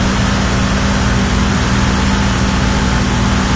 Vehicles; SFX Vehicles Scorpion

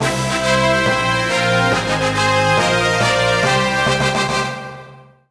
Enemy Defeated Fanfare; Dokapon Kingdom; Wii

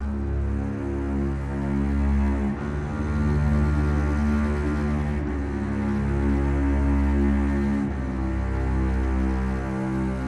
STRING DRONE; gamerip; ANCIENT SECRET

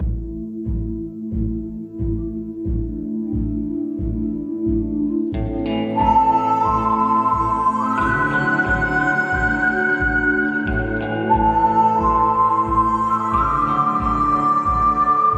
Western Daytime Ambient Loop; Fortnite

